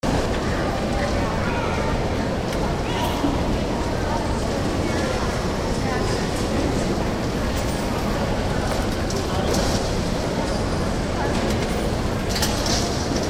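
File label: airport arrivals area interior; john wayne airport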